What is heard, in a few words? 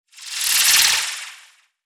Hip Sways; Evolve; Wraith